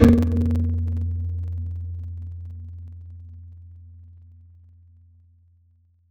Mallet; Instruments; Synthetic's Serum Collection; Echo Sound Works Core Tables

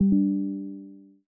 Windows Notify System Generic; Windows 11; Windows Sounds

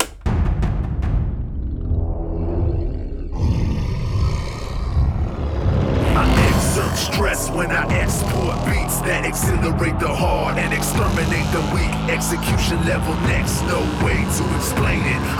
DUBSTEP; lolicore

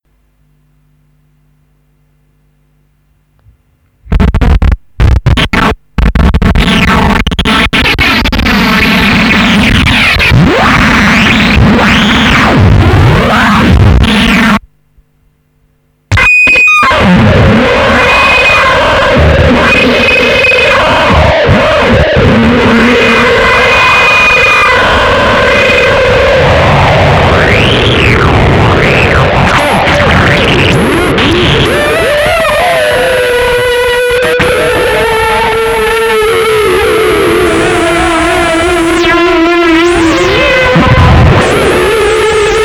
NOISE DUB; lolicore; WISPHONIX

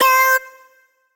Vox C2; Noises; Synthetic's Serum Collection

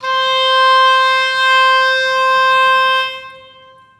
Oboe C3; Evolution Of Sound; Tropical Revolution

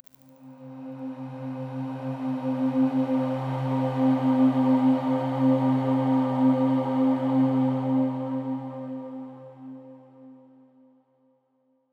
Serum ATMOSPHERE, Ambien; Synthetic's Serum Collection

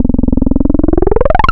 303 Acid; Synthetic's Serum Collection; Cymatics - 303 Acid for Serum Vol 2 Wavetables

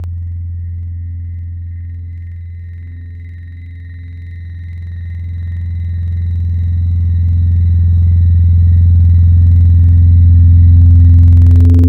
Digital Sine Fingers; Wavetables; Synthetic's Serum Collection; ESW Core Wavetables; Echo Sound Works Core Tables

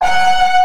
Crawl Vox F#; Aubit; Awake Vol. 1 Serum Noises

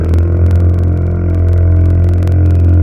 VOX Rap, Wavetables; Synthetic's Serum Collection; Standalone-Music SYMPHONY Wavetables

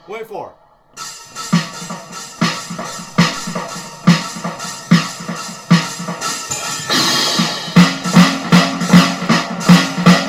Mediocre Punk Drumming Followed By Noisecore; lolicore

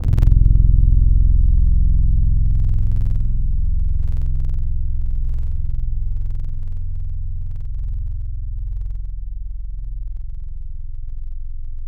Keys Mellow Piano; Synthetic's Serum Collection; ESW X Keys

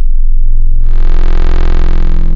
Groan II; Synthetic's Serum Collection; XLNT-Skrilla Tables

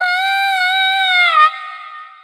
vocal adlibbed C3; Evolution Of Sound; Tropical Revolution

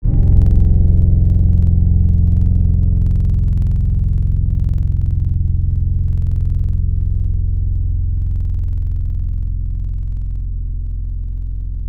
Keys Cimbalon; Synthetic's Serum Collection; ESW X Keys

